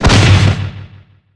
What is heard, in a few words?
thud